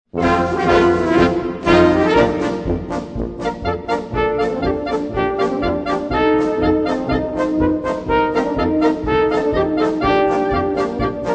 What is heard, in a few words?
AMSEL POLKA (BLACKBIRD)